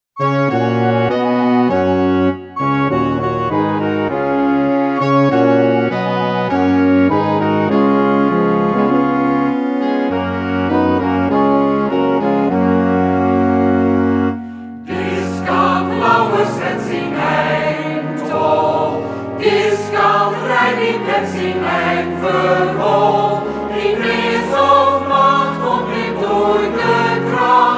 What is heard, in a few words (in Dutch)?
Volkslied vh Westerkwartier